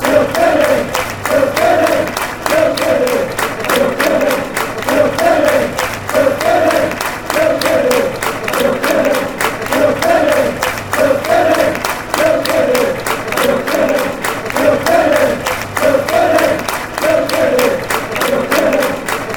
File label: kilkenny kilkenny, fanchants